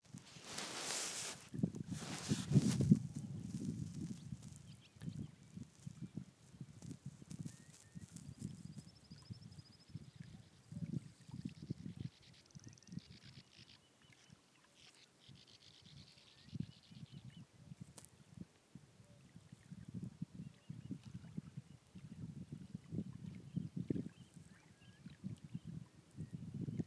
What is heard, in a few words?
dornoch firth